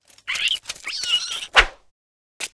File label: Hamster Action; Seer; GamigoNA